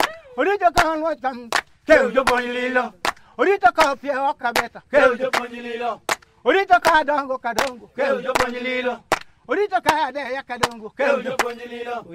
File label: 158 BPM; Global Influences Loops